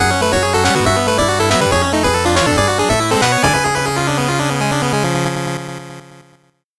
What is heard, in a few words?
8bit jingle slow